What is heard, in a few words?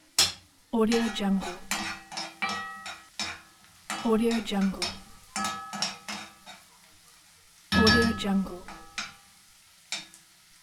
stir frying